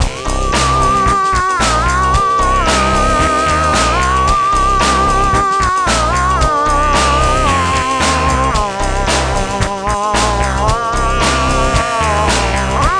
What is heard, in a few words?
Analog Boys